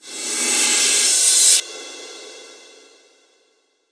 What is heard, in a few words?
Reverse Cym, Cymbals